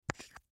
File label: Domino Pick Up, Domino Pick Up From Table; Board Games; SoundEffects-Blastwave; Sports